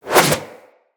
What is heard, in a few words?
Backflip Whoosh; Characters